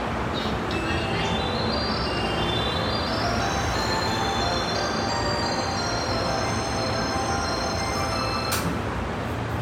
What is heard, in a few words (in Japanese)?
JR京浜東北線新杉田南, train sounds